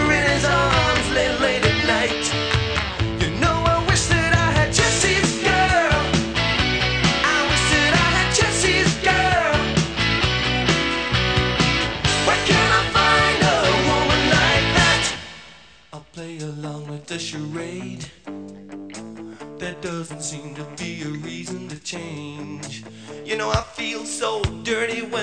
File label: rock